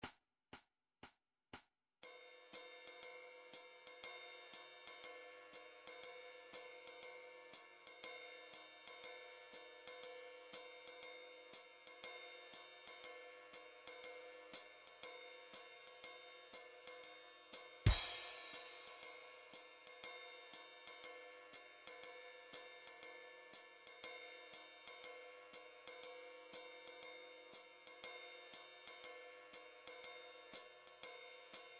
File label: drum trax jazz 120